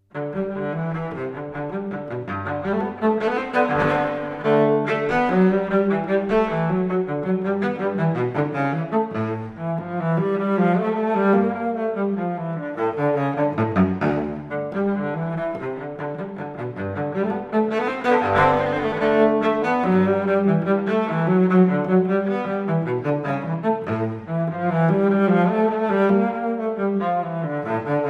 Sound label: Cello Solo, Suite No. 1 In G Major - Gigue; 6 Suites For Cello Solo J.S. Bach